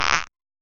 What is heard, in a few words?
the sound of a rubber, rubber duck